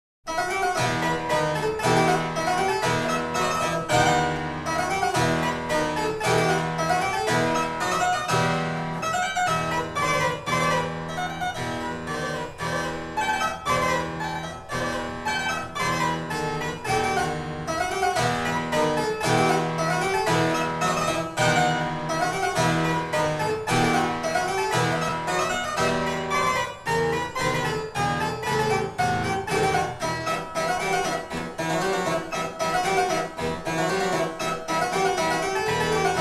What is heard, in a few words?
tambourin